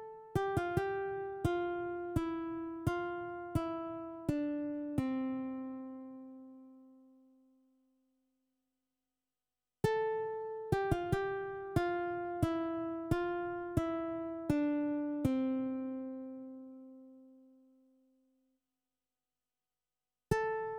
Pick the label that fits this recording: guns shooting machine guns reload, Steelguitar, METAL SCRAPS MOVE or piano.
Steelguitar